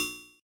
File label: Menu Cursor; Beyond Oasis